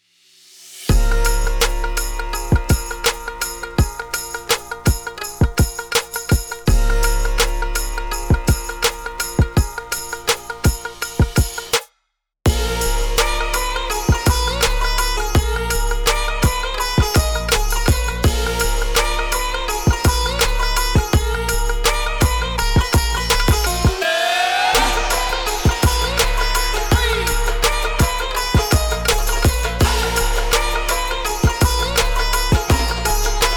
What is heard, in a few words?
indian trap